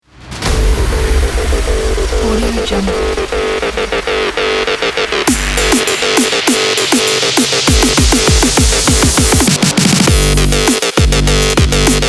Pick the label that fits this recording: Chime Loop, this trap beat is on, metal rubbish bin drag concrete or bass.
this trap beat is on